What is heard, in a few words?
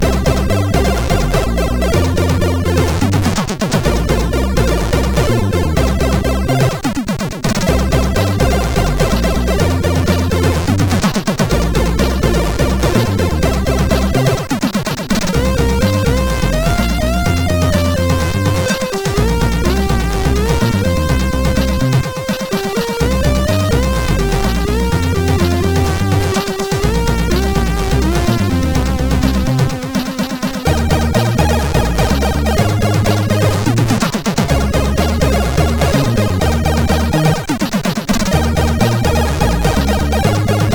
Intro, sid MOS6581R4; Elite